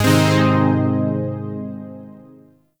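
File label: Shawzin Minor Chord; Zariman Shawzin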